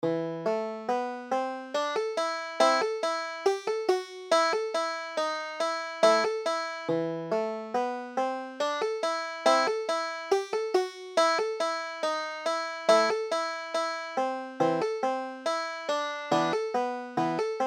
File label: waters of holland, banjo